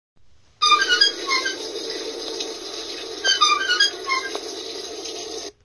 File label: The grinding sound of the washing machine when spinning